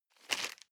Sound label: plastic bag small grab medium